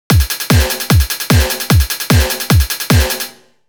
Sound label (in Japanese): 4キック＋スネア＋ハイハット16分で刻む; jpop 4beat rhythm miryoku tukurikata